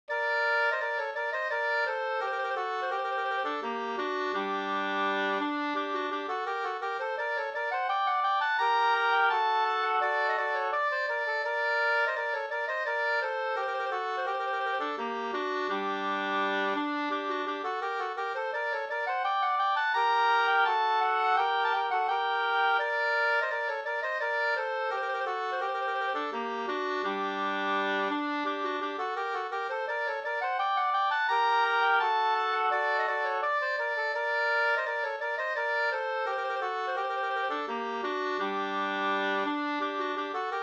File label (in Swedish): brudmarsch